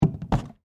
Suitcase Leather, Foley, Suitcase, Leather, Set, Down, On, Side, Hard, Shell, Antique; BlastWave